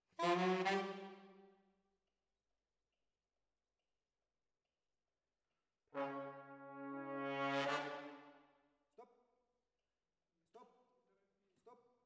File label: ORTF, Jazz for piano